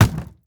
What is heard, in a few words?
wood hit